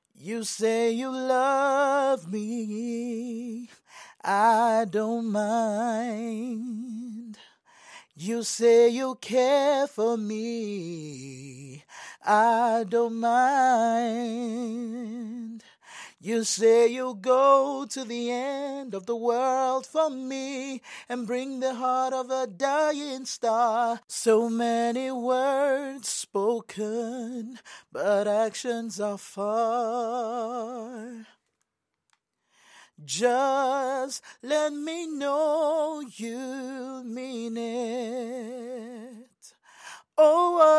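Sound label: pop rnb, 60, B flat minor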